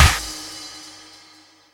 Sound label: FX crash, cymbals; Trance Explosion Vol1; Drums